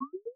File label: affirm detuned